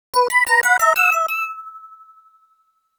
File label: INSERT COIN; Darius 30th Anniversary Box